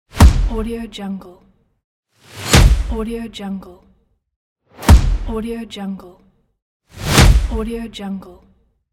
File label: body hit punch